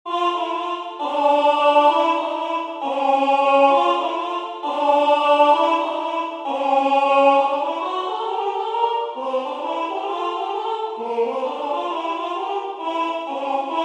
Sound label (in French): Canon des scats voix